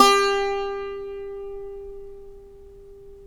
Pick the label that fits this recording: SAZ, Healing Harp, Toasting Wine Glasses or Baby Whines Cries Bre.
SAZ